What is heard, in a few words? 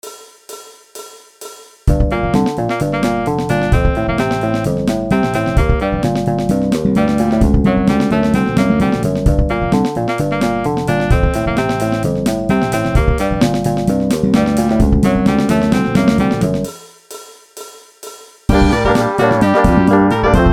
funky 60's; ghost